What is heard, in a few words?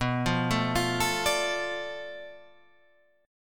B Minor 7th Flat 5th B Guitar Half Step Down; Bm7b5